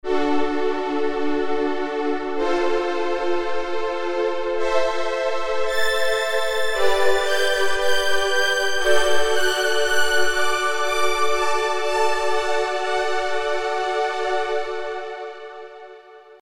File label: String, Alesis Micron; Factory Programs; SilkyVerySilky